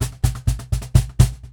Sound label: Cajon Fill; musicradar